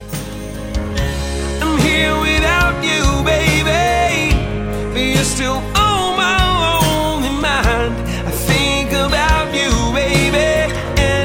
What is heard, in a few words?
Acoustic